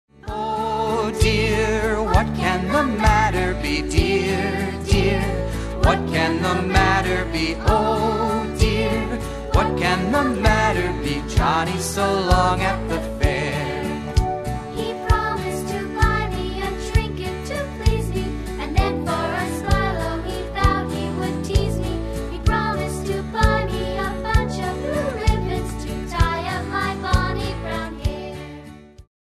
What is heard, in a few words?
karaoke vocal oh dear what can the matter be; childrens favorite songs; disney